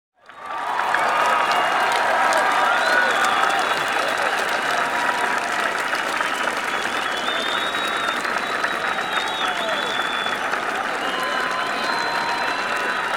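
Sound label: large crowd applause; Asian Show Sound Effects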